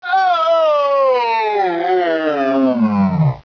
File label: die, controller; svencoop; cracklife